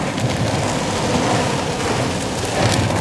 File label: plastic box scrape smooth loop